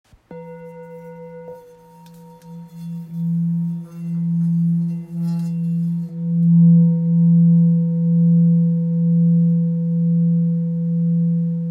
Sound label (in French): Bol bengale mi